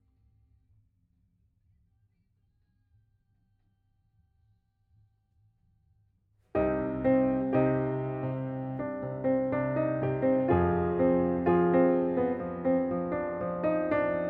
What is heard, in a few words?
Piano